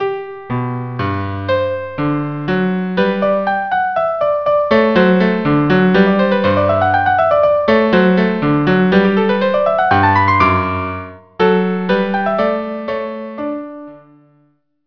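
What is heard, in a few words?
violin cello duo, minuet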